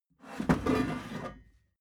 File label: metal scrape wood soft